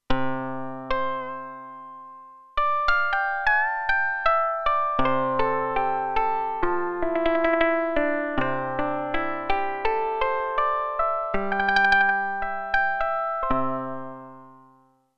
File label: Koto, Roland Alpha Juno-2; Original Sound Chart patches